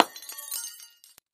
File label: Light Bulb Crash